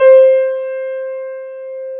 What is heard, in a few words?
piano synth